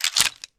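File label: bird arrow, attack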